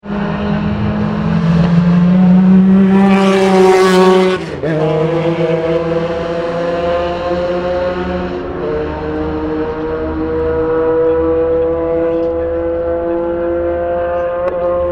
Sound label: porsche 911 accelerates on mulsanne; 2006